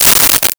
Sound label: Coins Poured Out, Foley